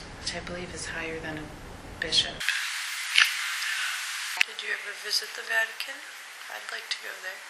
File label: evp formal dining rectory